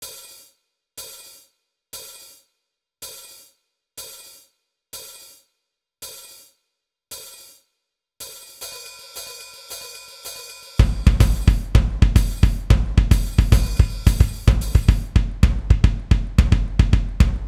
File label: drum set song